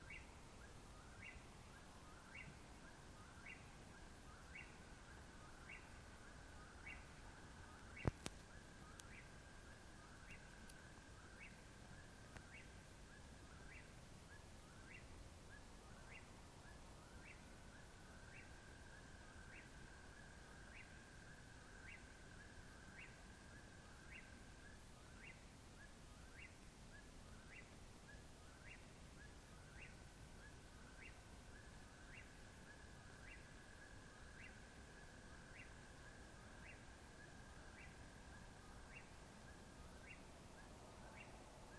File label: whip poor will